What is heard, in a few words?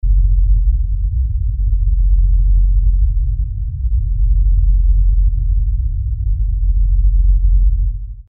Bass